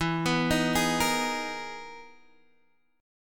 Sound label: E Major Sharp 11th, Guitar, Standard; arpeggiated; JGuitar